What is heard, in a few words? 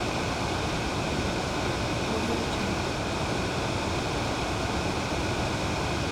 car interior intense blower motor loop